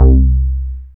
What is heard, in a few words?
BAS, Eurotech; Sounds Good